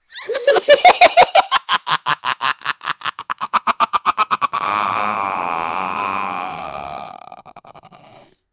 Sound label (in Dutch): kan je een lachende horro, clown